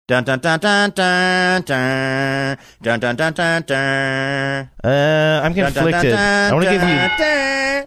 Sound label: singing theme; hawaii five o